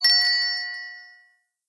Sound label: Chime; blue archive